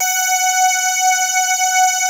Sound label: basses; Wizoo - Powered Wave